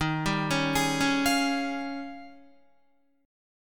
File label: Eb 11th Eb, down, Guitar, 4F,4A,5C,5G,5C,6E; Eb11 arpeggiated